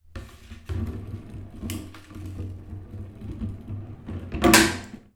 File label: tub plastic roll in bathtub; foley